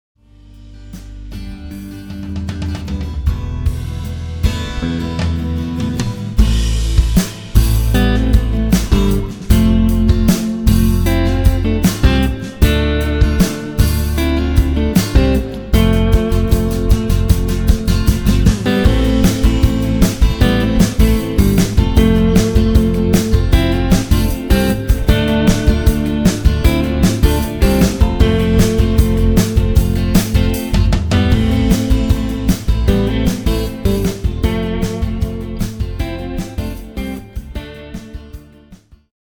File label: No Vocals